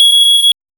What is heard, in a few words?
correct answer ding, game